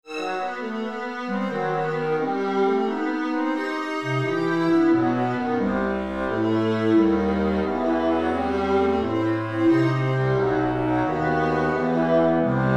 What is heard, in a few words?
Viola Concerto; III. Allegro